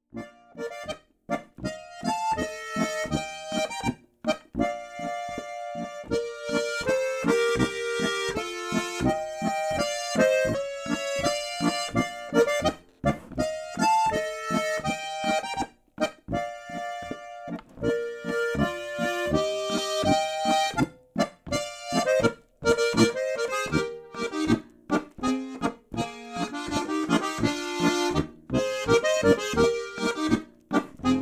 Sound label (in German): Boarischer; Dupferl